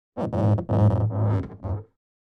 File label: Rubber balloon friction handling